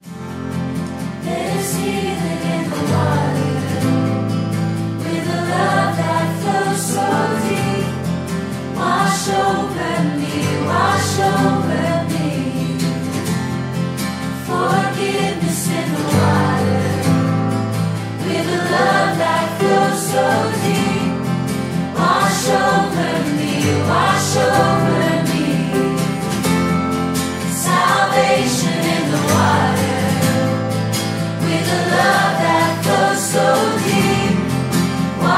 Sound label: acoustic sample